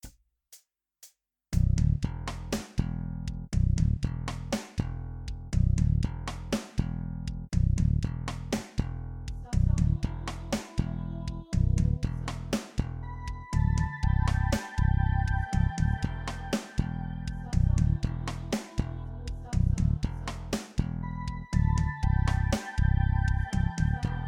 farfisa; arturia; arturia v collection 5

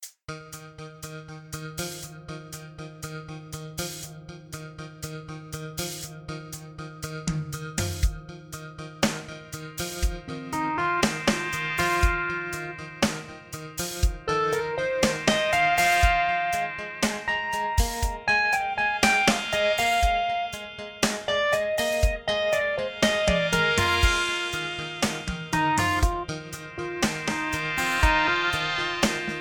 ChipBlue, Vintage Tines MKII; Electric Piano; Modartt; Pianoteq

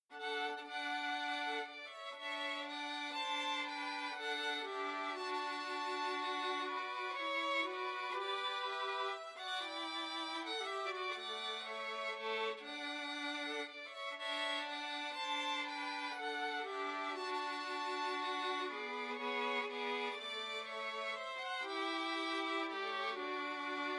violin; Spitfire Audio PP016 Artisan Violin

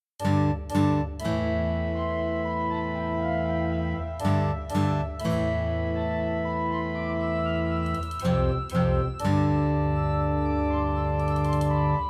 Pipe Organ; Toontrack; EZkeys Pipe Organ von Toontrack

